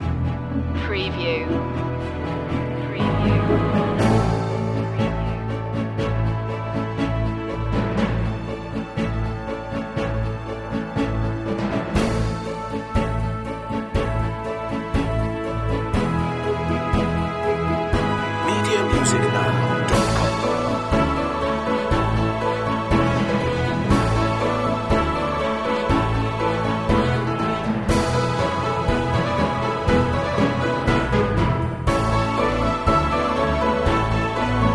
european anthem ode to joy

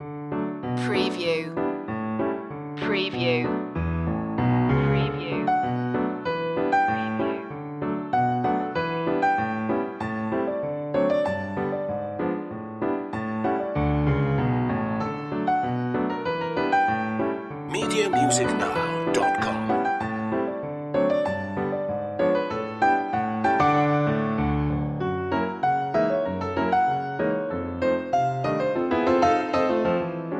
ragtime piano

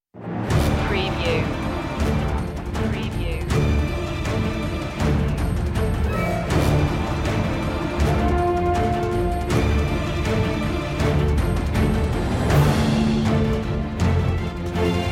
no choir

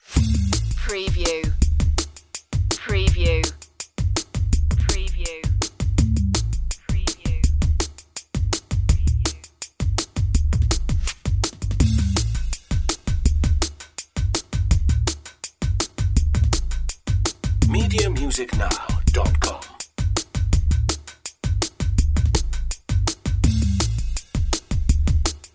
subtunnel drums bass only

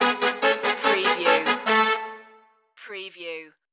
give me one time stinger

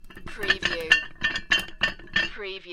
Heavy Chain Rattling On Winch Short

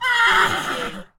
Horse neigh

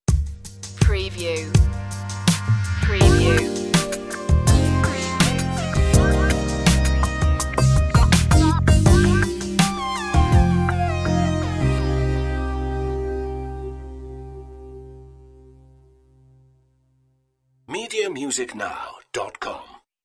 stab, chill

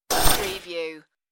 space door lock

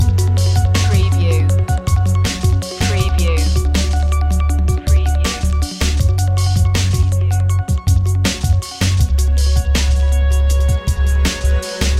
blue strings loop

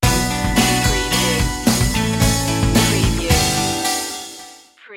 quiet determination sting

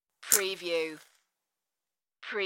Match Flame

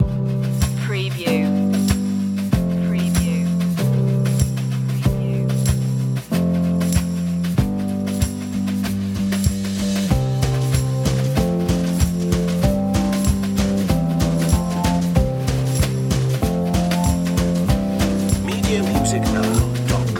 conifers loop

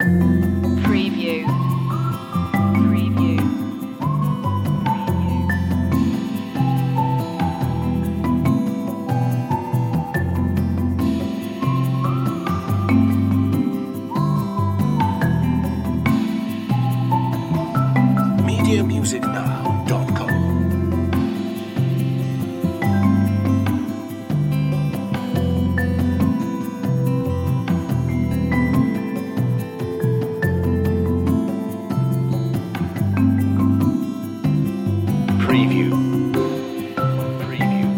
gemini 2 loop

